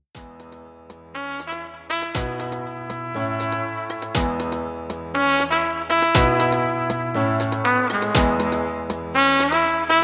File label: Pop Redrum; Remixfreakz